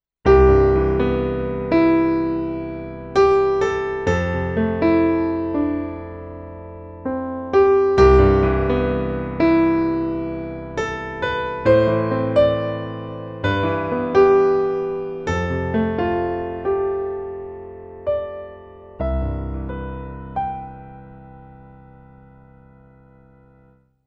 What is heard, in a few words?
Roland VR 730 Grand Piano